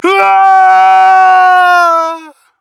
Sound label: Falling; Paladins; Warrior